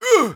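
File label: pain sharp, German; Medic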